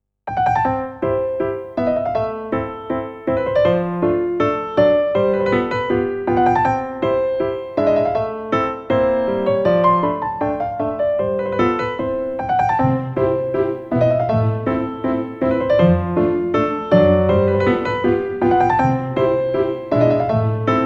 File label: Mozart Menuet; SE Cool Mozart Menuet; Sony Ericsson; PlusFourSix